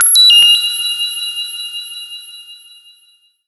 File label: SHORT, SHORTNOIS